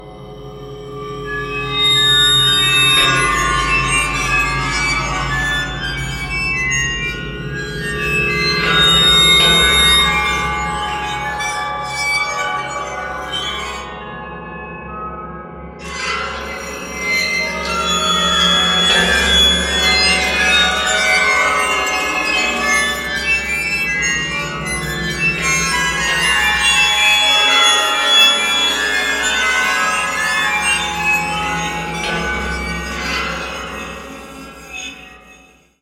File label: horror creaking noises ringtone